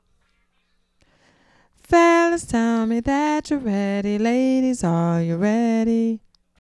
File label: epic diva